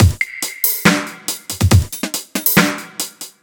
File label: drum loop, Dubstep